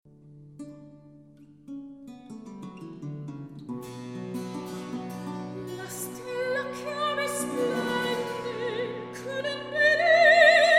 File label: Recitativo; FONS MUSICAE; Steffani